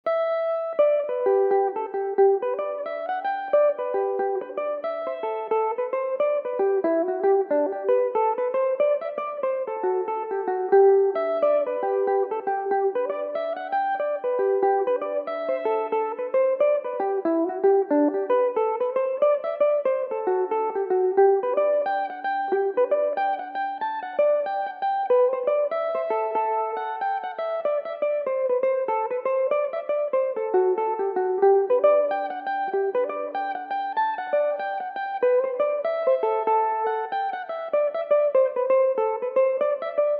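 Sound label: G major belmuse, irish mandolin; The Green Forest; (6/8); Belmuse EM-190 electric mandolin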